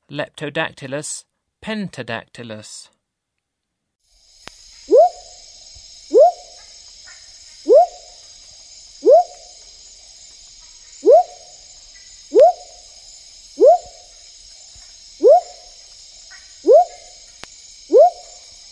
Leptodactylus pentadactylus, Frogs of Ecuadorian Amazon; S. America